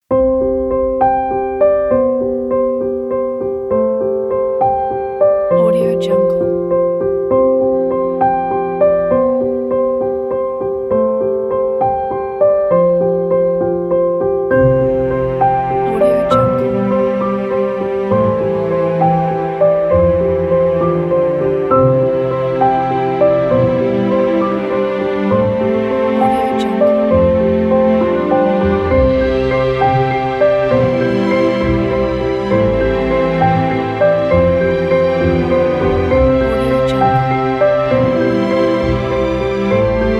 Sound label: an emotional piano film score